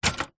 office typewriter single button key press typebar strike